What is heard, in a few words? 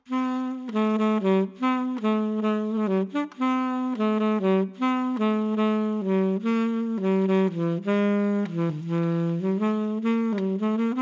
SELMER ALTO PADLESS; timbri